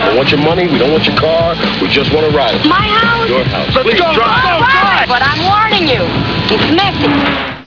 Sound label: ride